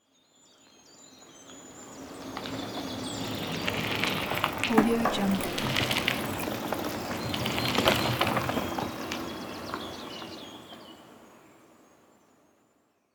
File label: bicycles pass by